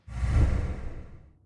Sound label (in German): menu gegnerauswahl; magic 2014